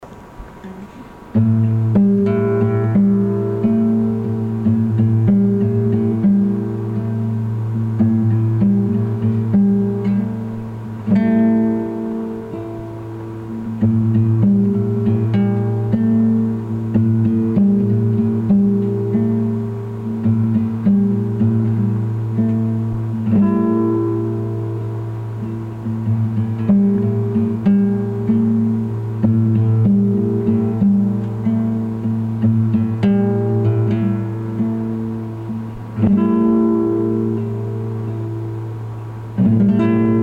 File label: Guitar